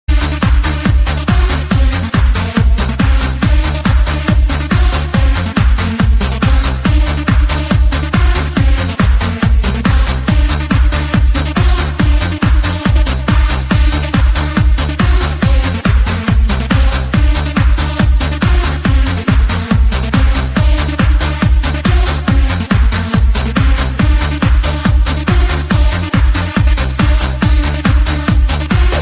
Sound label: New Dj Sound